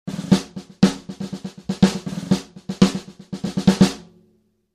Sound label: snappy; kent champagne